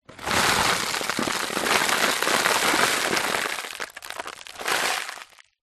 dirt debris small in plastic bottle tip movement

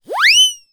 cartoon siren whistle short

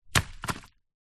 large stick drop on ground; foley; zapsplat

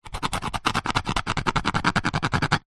foley plastic sharp object scratch; zapsplat

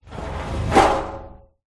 metal scrape resonate garage door; foley

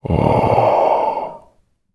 human person deep pleasurable sigh

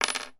industrial drywall screw drop on table

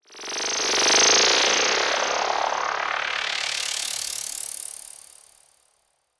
sound design fast stutter tone warble

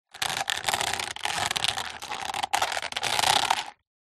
plastic object cheap tacky movement creaks; foley

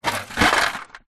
household freezer ice cube plastic tray open; zapsplat

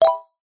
game sound plink positive wooden

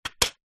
box card small safety pins lid off set down; household

